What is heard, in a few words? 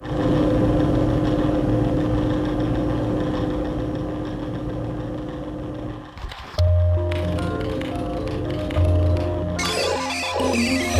Complete; Tekken 3; PS1 gamerip 1998